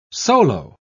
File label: solo